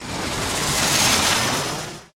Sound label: airplane